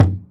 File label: hit glass; impact; midnight guns